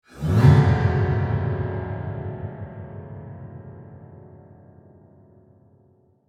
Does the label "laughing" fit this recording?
No